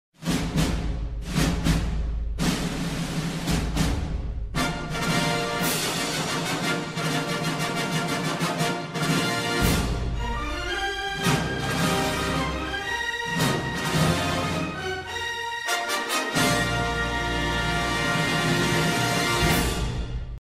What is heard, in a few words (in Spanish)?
BIENVENIDA INTRO TIPO PELICULA